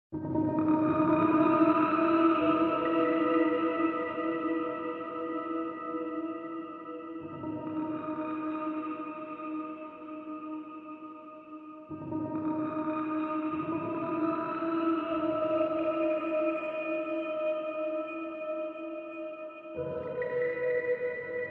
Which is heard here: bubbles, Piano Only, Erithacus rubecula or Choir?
Piano Only